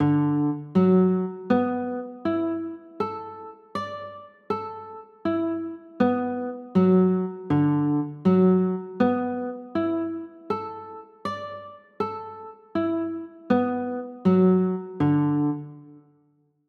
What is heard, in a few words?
d 6 9